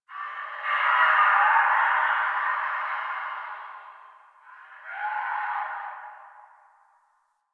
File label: Distant Infested Screams Winter; Warframe; Ambience; Nineteen Ninety Nine